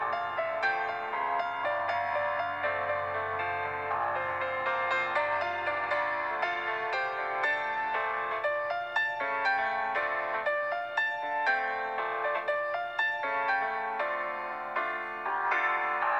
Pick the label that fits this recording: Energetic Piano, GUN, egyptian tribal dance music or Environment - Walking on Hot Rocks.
Energetic Piano